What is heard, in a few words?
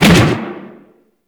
Fist Whoosh; Warframe; Weapons; Archwing; Melee; Regor Fists